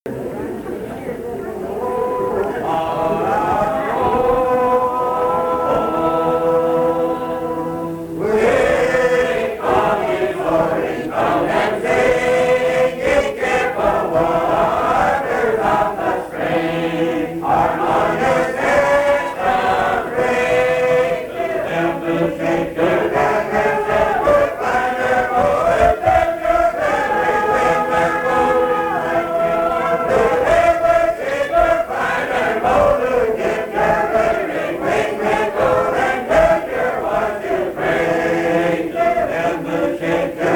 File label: Harmony, Sing; 1980 Smith Co.; New Harmony, TX